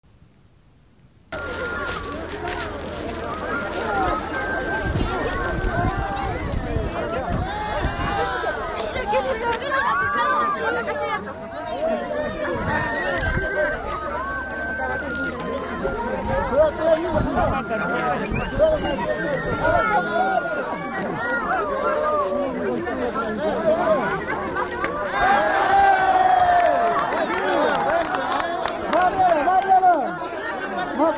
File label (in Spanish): tiro de honda con membrillo a la campana de la iglesia; Arcasaya